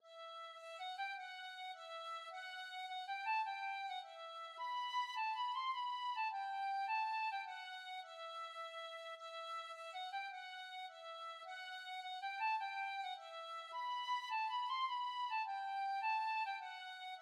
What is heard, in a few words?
O the deep love, tin whistle